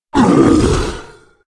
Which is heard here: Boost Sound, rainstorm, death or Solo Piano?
death